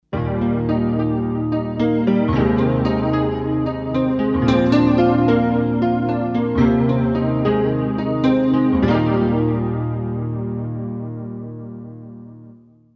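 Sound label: Piano Reverb Chorus Phase Eb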